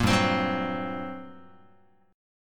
G Minor Major 7th Double Flat 5th, G, Guitar; strummed; JGuitar

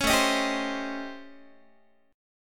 A 7th Sharp 9th Flat 5th, Guitar; A7#9b5; strummed; JGuitar

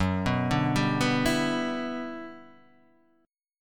G Major 13th

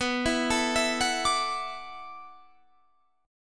E 7th Suspended 2nd Suspended 4th, B

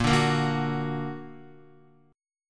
Bb Augmented Bb, Guitar; strummed; JGuitar

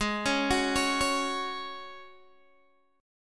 G sharp 7th Suspended 4th G sharp, Guitar Open G

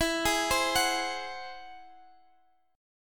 G sharp Augmented 7th E

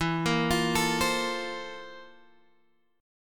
C 13th E

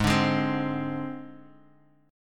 C Suspended 2nd Sharp 5th Ab

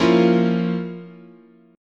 F Major 7th Suspended 4th Sharp 5th, chord

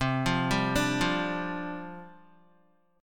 C Suspended 2nd Suspended 4th, down, Guitar

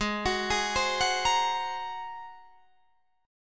G sharp 9th, Guitar, Open D

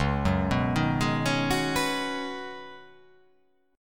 Db 9th Suspended 4th, Db9sus4 chord; arpeggiated; JGuitar

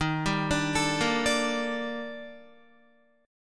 Eb Major Sharp 11th, down, Guitar; arpeggiated; chord

